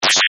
Multimedia Action, Technology, Multimedia, Action, Element; Alert Sound Effects; Alerts (Sound Effects:Multimedia); BlastWave